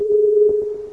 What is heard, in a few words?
End Prize Show; Golden Boot Ultimate